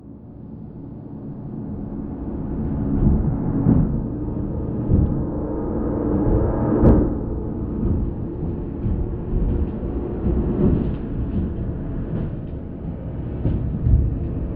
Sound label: Scary Banging